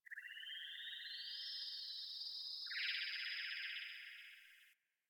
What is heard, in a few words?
Venus Landscape Distant Corpus Texture; Ambience; Warframe; Venus Open World